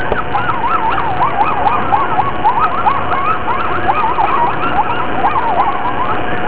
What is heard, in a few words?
Coyote